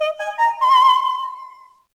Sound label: New audio object; LittleBigPlanet 2; PS3